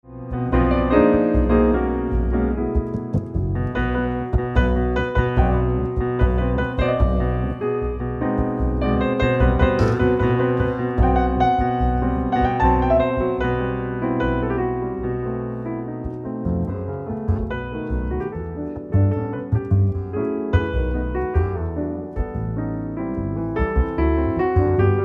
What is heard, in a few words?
ewi piano duet